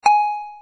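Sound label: time, mahjong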